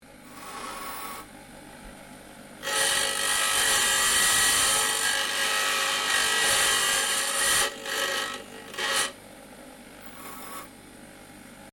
Dropsaw grinder blade cutting, Dropsaw metal cutting; Saws; Machines; SoundEffects Foundation2